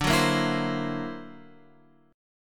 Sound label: D Augmented 9th down Guitar Open F; D+9 strummed; JGuitar